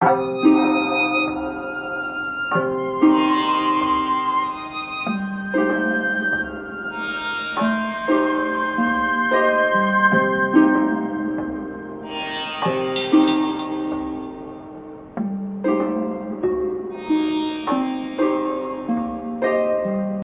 melody; Book Of Queen

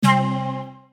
stop bonus; Enchanted Cleopatra

